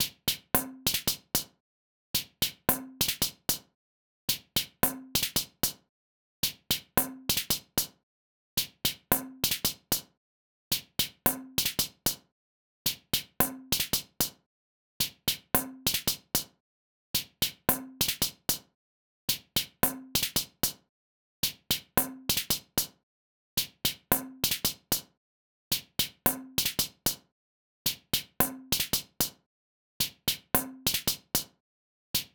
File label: Hat